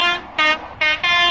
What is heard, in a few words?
diesel